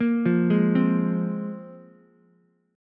A sharp 7th Suspended 2nd, Ukulele chord; arpeggiated; JGuitar

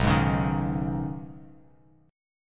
B 6th Add 9th B down Bass Standard; strummed

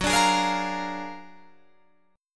F sharp Augmented Major 9th F sharp Guitar Open D

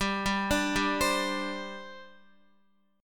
G Suspended 4th, Guitar; Gsus4 arpeggiated

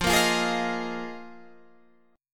Eb Suspended 2nd Suspended 4th

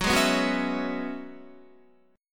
Gb 7th Suspended 2nd Suspended 4th, Guitar; Gb7sus2sus4 strummed

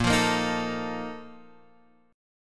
A sharp Major 7th Suspended 4th; A#M7sus4 Chord {6 X 3 3 4 5} strummed

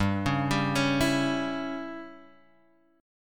Db Diminished G

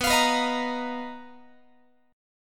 B Major 7th Flat 5th B down Guitar; strummed

